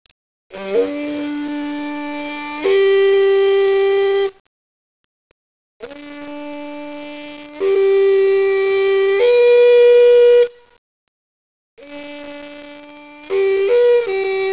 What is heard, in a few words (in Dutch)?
geluid, midwinterhoorn blazen